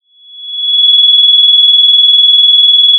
pop, Sound of a phone ringing

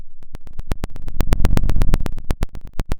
pop, Sound of a fire crackling

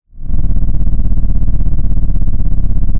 Sound of an engine revving

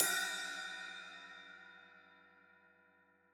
Trash B, drum

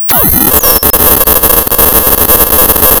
pop, tearing paper

